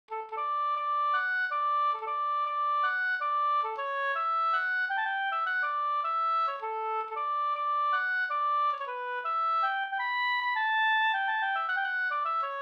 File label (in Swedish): polska Bodapolska